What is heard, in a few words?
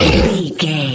troll monster hurt pain